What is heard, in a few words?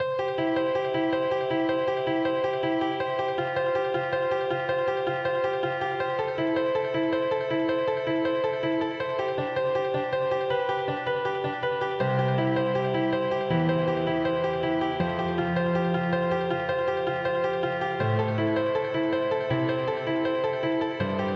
Quickly Indily